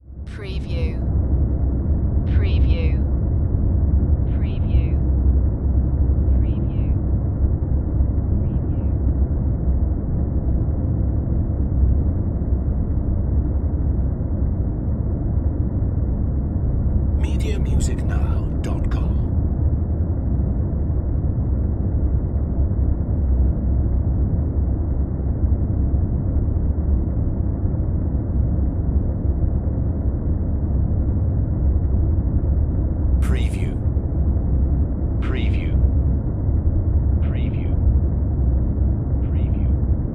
Planet Atmosphere

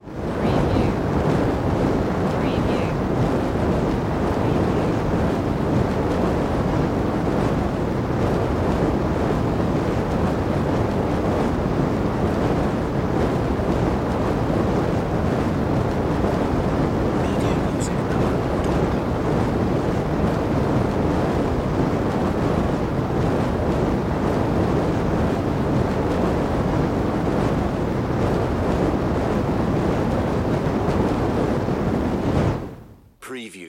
Flame Movement Heavy

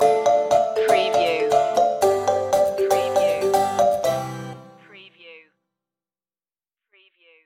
my jamaican love stinger

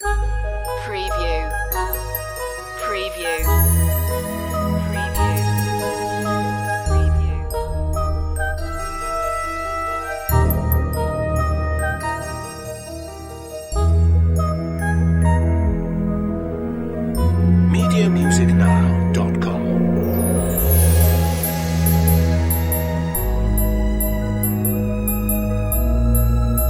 grace and harmony

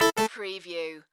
Casino Slot Machine Harpsi

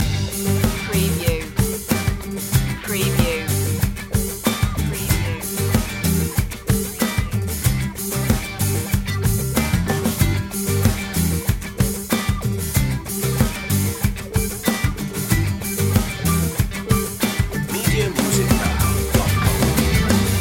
crash loop

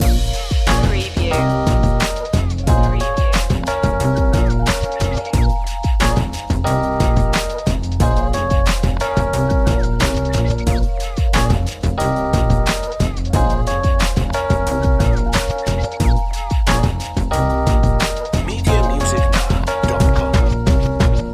hip hop new york loop

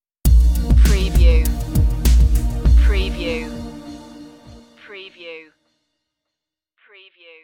space age stinger

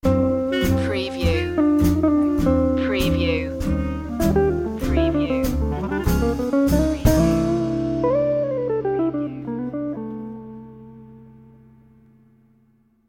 sting

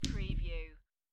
Dice Throw Two Dice On Felt

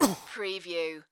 Pain Umph, Male Painful Grunt, Hurt

